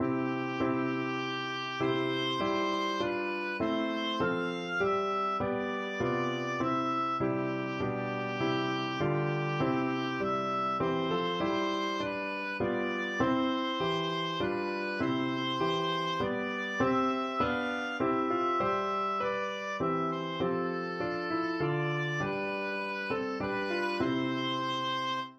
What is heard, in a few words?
wiltshire, oboe